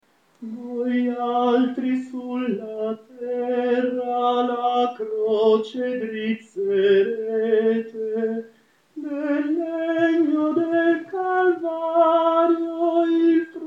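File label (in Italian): allora sapete tenore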